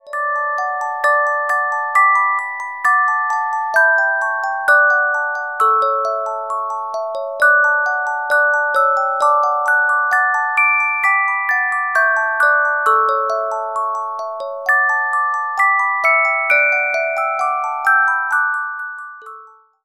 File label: Carillon Music Box Melodies